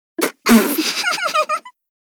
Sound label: Laugh; Li Li; Heroes of the Storm; warcraft